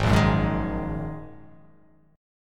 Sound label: G sharp 7th Suspended 2nd G sharp